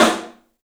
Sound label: STEEL, Drums, ROOM SINGLE; 3D Sound